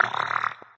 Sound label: fart wet short; Farts